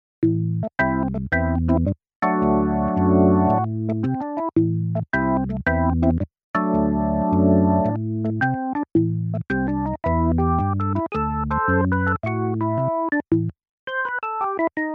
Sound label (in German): Artis SE Orgel